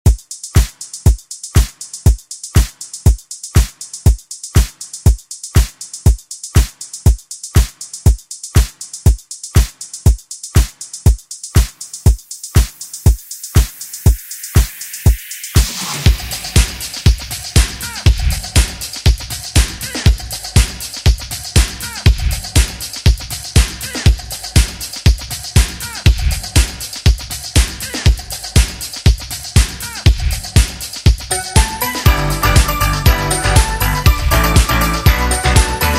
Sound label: Latin Pop Redrum snippets; Deejay Hit